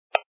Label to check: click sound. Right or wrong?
Right